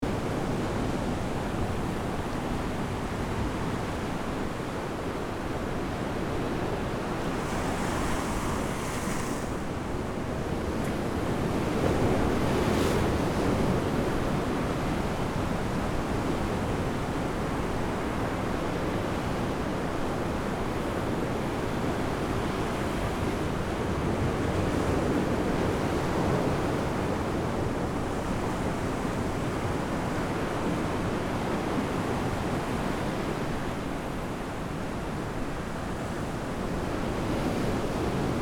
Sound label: Powerful ocean waves crashing loop